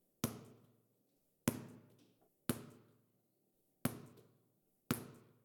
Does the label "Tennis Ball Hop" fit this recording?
Yes